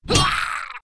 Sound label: daemon attack; propack